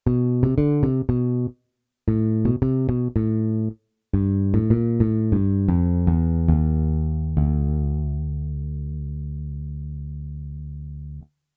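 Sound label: failed short musical bass melody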